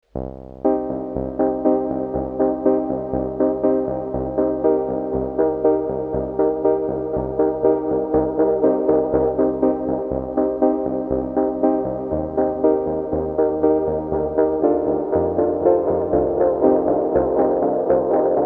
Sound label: T-REX Replicator Piano long Delay Feedback